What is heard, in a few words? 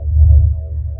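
Ambience Sci-fi Energy Loop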